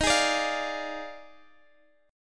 Eb Major 7th Suspended 2nd Suspended 4th Eb Guitar Standard; strummed; x,x,13,13,15,13; EbM7sus2sus4